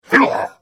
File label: Hit; The Elder Scrolls III - Morrowind